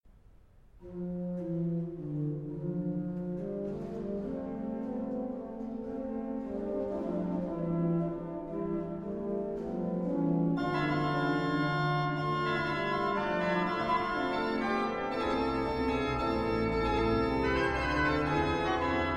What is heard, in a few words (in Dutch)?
Orgelpartita; Variatie 2